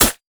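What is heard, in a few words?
siege rider basic attack, minions; LoL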